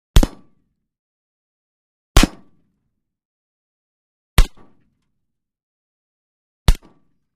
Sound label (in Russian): zvuki odinochnyh vystrelov s glushitelem